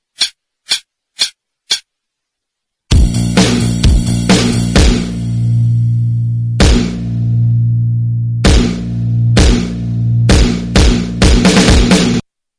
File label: Solo Backing, guitar